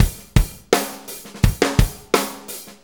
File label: Fonky, Drum Tools, Loops